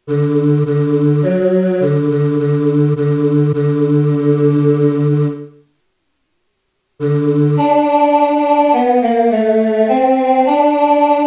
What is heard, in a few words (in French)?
basse